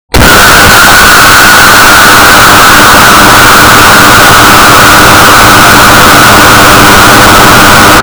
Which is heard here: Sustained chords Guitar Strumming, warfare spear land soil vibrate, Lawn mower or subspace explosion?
subspace explosion